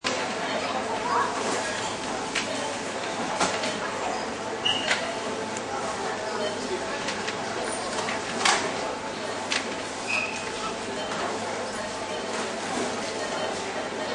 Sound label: Supermarket Near Checkout, Supermarket store ambience, near checkout area; Light traffic. Tills beeping, scanning bar codes. People shopping; People shopping and; Stores And Mall; Lynne Music